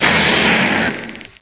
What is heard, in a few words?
cry; source of the sovereign